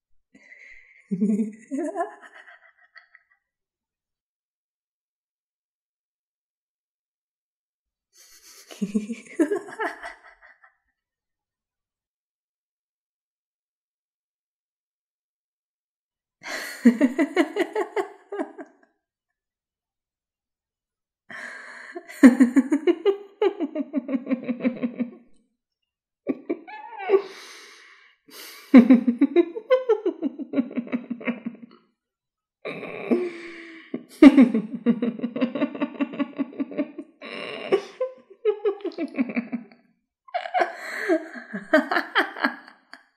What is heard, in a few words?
evil laugh